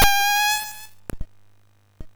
Aurum, MOS6581R4

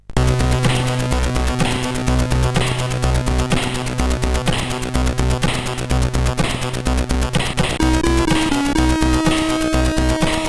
Super Cars 4, CSG8580R5; sid

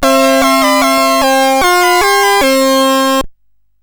sid CSG8580R5; Fiendish Freddys Big Top O Fun

